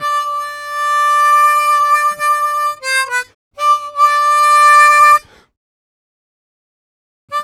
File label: Harm 129bpm G, Harmonica